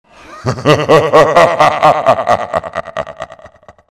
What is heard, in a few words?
laugh